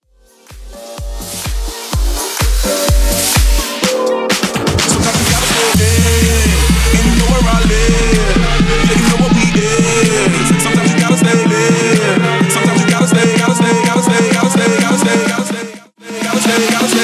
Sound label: Energy House Clean 126bpm